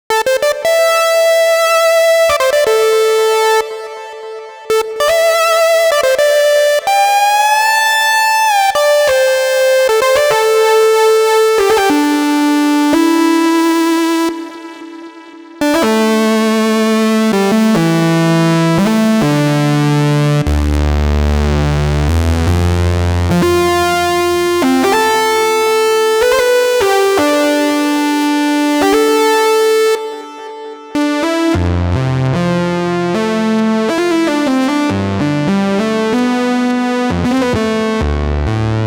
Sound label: prophet 6 screamer; analog